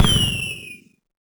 rocket; HeavenStudio; fireworks

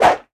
swing; HeavenStudio; spaceball

